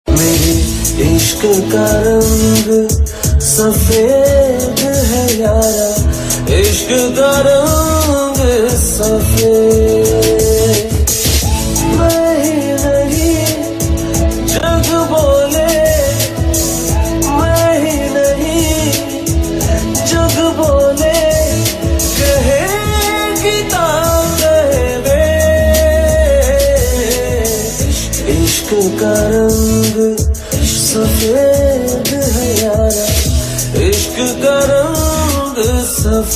Male Version; TV Serial Tone; Colors TV; Ishq Ka Rang Safed

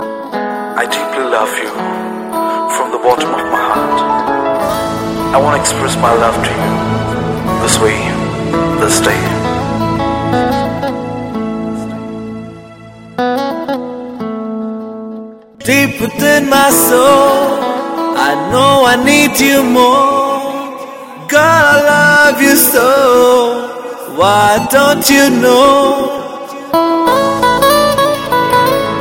tone; Valentine Tones; Valentines Spl

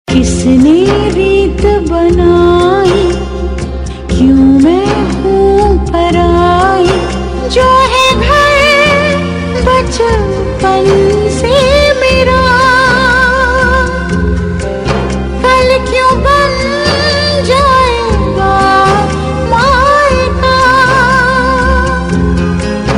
Sad Title Tune

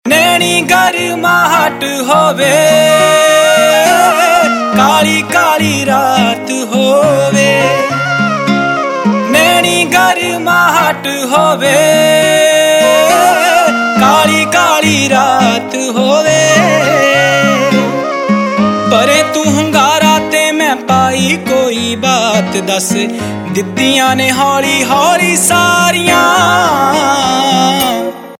Unplugged; Punjabi Pop Ringtone

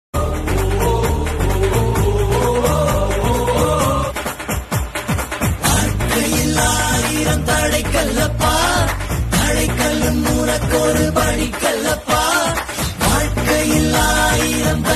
Tamil Telgu Music Ringtone

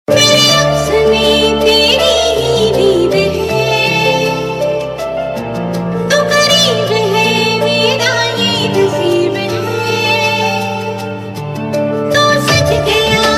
Male And Female Version; Iss Pyaar Ko Kya Naam Doon - Ek Baar Phir; Star Plus

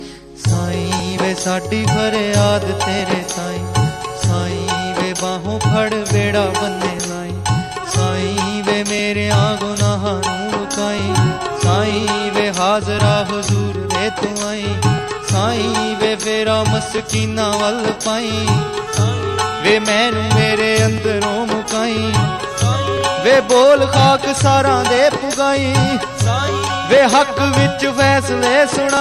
Punjabi; Sai Baba Ringtone

